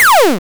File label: hurt